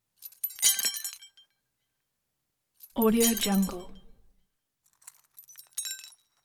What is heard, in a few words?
metallic drop